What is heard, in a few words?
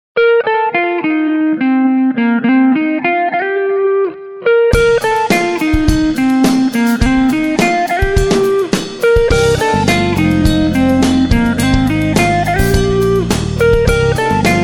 smooth jazz night